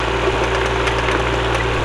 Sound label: robot; Crazy Machines; Играет вся семья